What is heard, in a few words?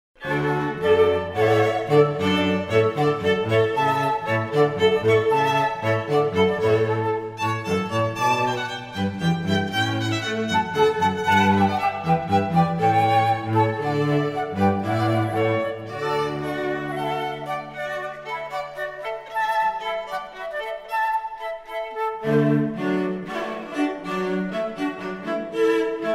Hornpipe, quartets; Water Music Suite